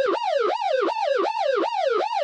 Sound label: LRAD Alarm; Lethal Company